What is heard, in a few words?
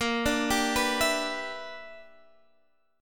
E Minor 7th Bb; Em7/Bb; arpeggiated